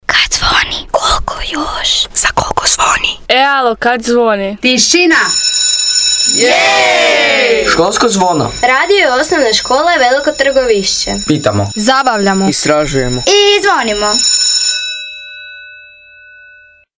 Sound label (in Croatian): skolsko zvono